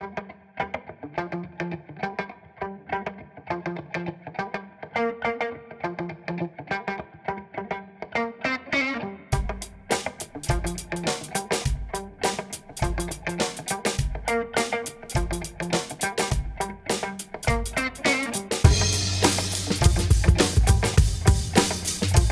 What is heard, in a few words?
drum bass